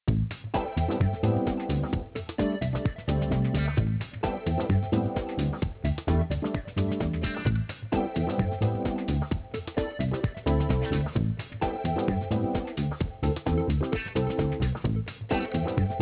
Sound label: queue funky jazz midtempo 122